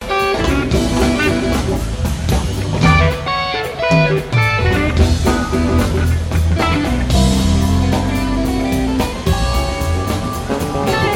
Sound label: Instrumental